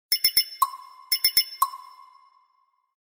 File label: message, notifications; Hisense U820